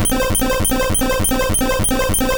Solar Beam Charge; sfx; attack moves; pokemon; gen 2; gsc

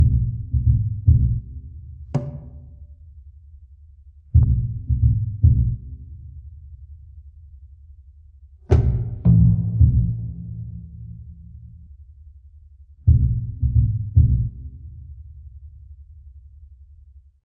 Jungle Level

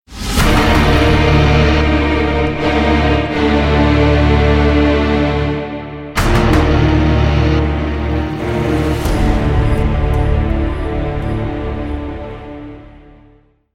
defeat

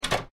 Door Lock In Place

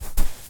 footstep sand run

